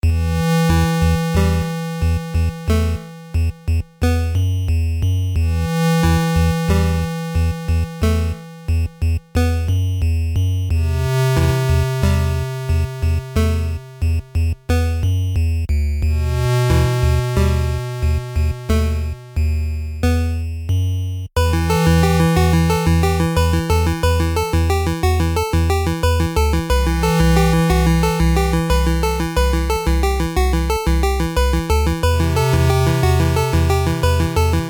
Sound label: night chip; RingOfRaces